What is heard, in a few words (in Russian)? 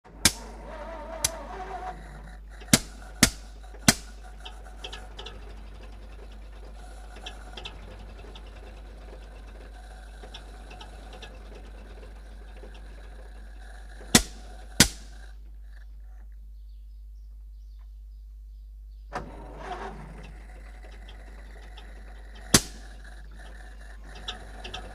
starinnij avtomobil vihlopnaya truba shyolkaet